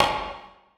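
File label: conga slap; Percussion; Sonatina Symphonic Orchestra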